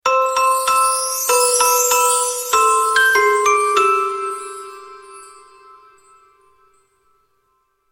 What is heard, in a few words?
christmas alarm tone ringtone; android